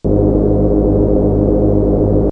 FUZZ; Rubber Chicken Software Sample Bank

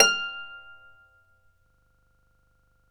Celtic Harp, Plucked Strings

